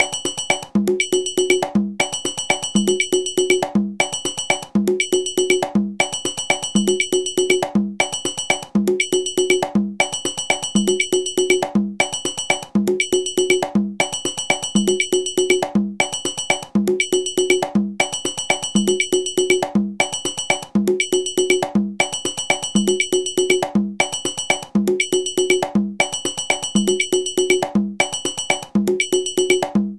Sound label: dengue